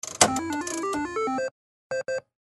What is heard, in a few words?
lose